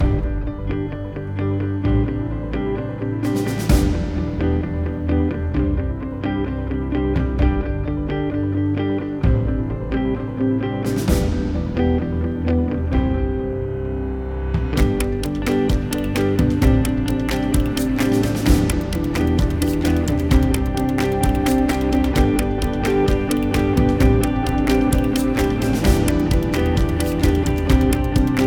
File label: Lift Up Guitar